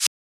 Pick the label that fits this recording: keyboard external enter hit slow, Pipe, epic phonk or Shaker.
Shaker